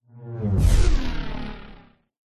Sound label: sound design hit groaning lead into buzzing tail; zapsplat